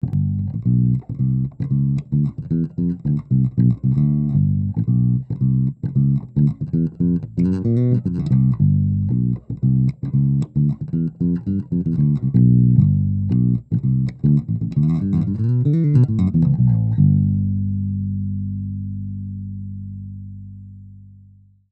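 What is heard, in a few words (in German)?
gezupft, bass; phil jones double four bg-75; fodera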